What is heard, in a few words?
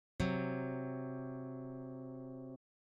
intervals harmonic tritone; Augmented Fourth; Diminished Fifth